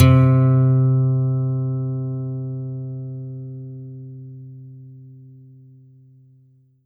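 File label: Roland JV-2080 Steel String Guitar C3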